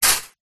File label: burn finger body part touch hot surface sizzle short; zapsplat